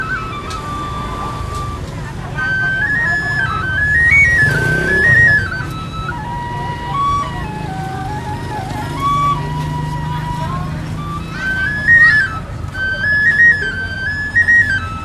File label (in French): Flute cambodge